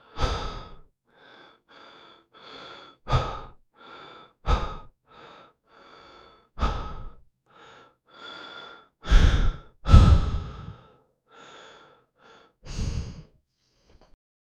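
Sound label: exaggerated heavy breathing; intense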